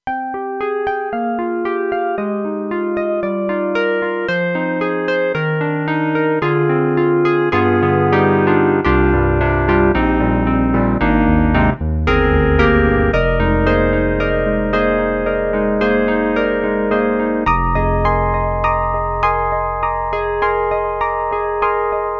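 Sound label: classic Wurlitzer, TRITON Rack; KORG EXB-PCM08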